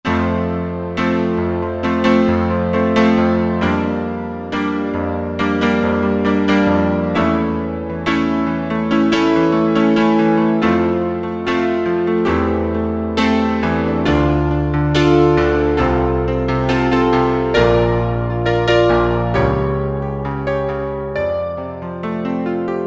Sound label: SA Piano, Roland SR-JV80-03 Piano